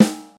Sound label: 2000s short acoustic snare g sharp key; sample